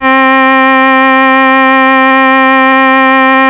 Casio VL1 Violin; Solo-Synths; Giga Samples Collection

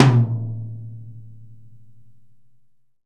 Tom, EMU PX-7 Drum Machines

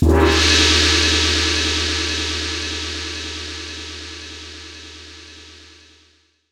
Crash, impact samples; Crashes

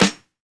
CLAP SNR; Drums In Your FACE!

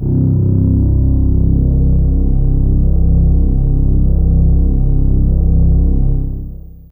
Low Str C2, LoString; Fairlight CMI IIe

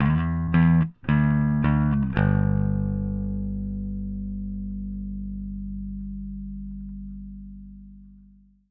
Bass Ending, Indie Rock; Sonic Foundry (Sony Creative Software); Indie Rock Anthems; Session 01 - Brighton

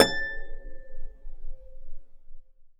A5 HARP; USB Soundscan vol.62; AKAI

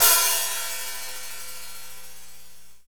HAT, Drumscapes Roland

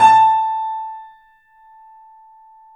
The Piano; InVision Interactive - The Piano

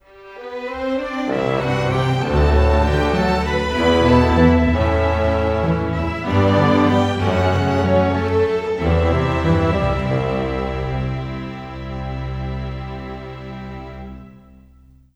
Rock Pop, Orchestral; Sonic Foundry (Sony Creative Software); Orchestral Series VOL-4; Rock and Pop; Rock-Pop 04

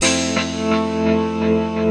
E PIANO 125, Keys; Houseworx

